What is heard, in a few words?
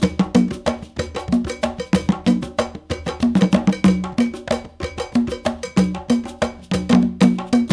Bembe 6/8, World Dance; Spectrasonics - Supreme Beats - World Dance